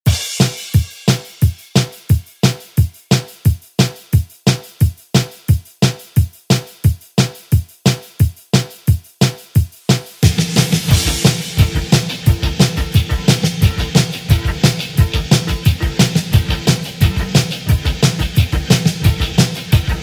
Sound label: 80s ReDrum; DJ Mhark